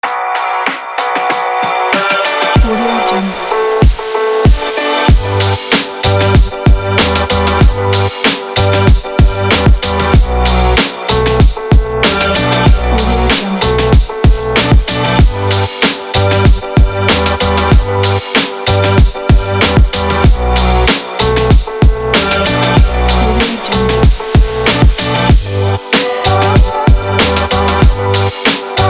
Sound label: background vlog